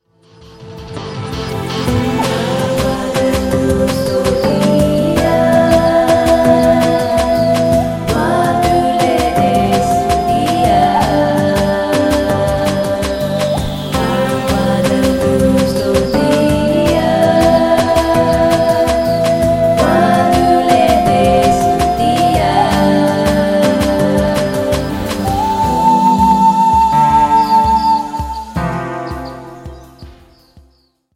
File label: Native Trance